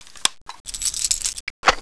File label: saw reload, weapons